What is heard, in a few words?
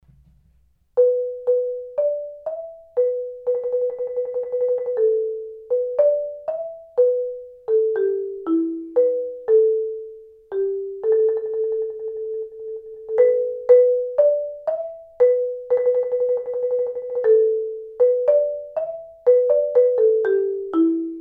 mallets